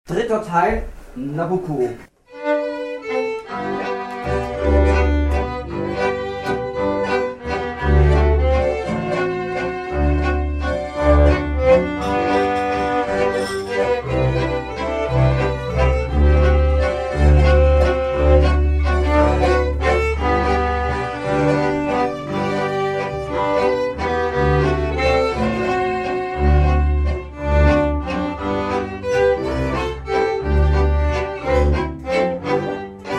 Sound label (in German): Walzer Trio; Nabucco